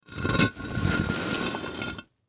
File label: Brick Drag Movement; Brick Drag Dirt Rock Scrape; Dragging; Foley; Objects; Blastwave